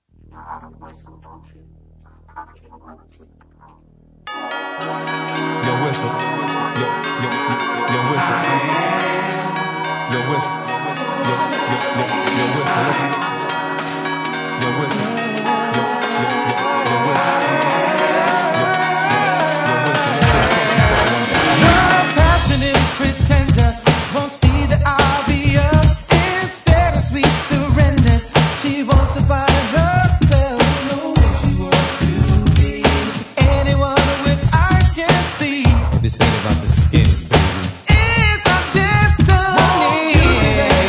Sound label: Whistle